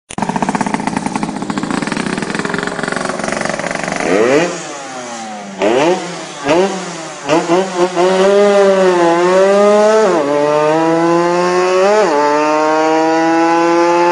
Nhạc chuông tiếng bò xe

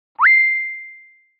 nhac chuong top tinh messenger

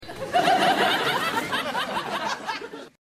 Tieng cuoi; faptv

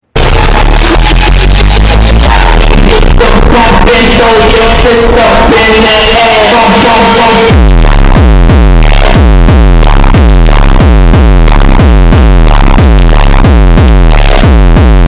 nhac chuong bao thuc to nhat

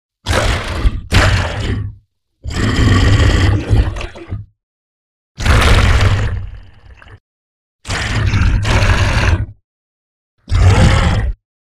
Tieng gam gu hung han cua thu khong lo

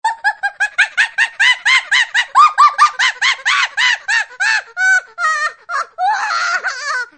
Nhac chuong tieng con khi cuoi

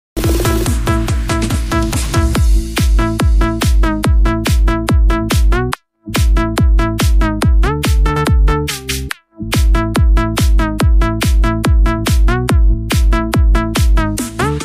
nhạc chuông cực chất

